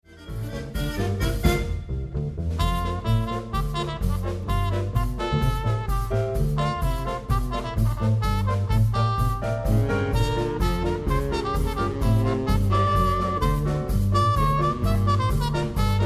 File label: hush mute